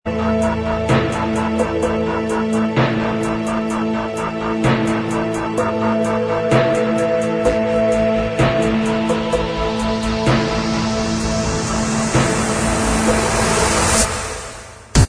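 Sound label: dubstep remix, instrumental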